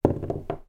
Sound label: office eraser rubber drop on desk